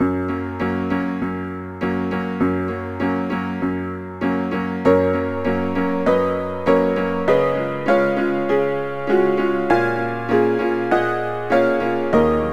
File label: Dance Chachacha Medium; knigge spielend zum guten benehmen; nds